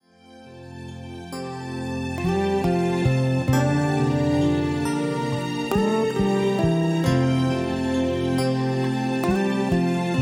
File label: Instrumental